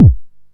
bass drum a key, kickdrum sample tuned to the A note. Loudest frequency: 153Hz; kick drum samples; drum single hits one shots